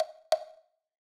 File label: wood hit, grime, 140bpm; Zero-G Sound Sense Grime Scene; GS Construction Kits; Its Primetime